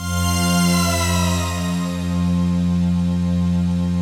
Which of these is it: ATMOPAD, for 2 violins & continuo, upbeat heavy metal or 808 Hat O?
ATMOPAD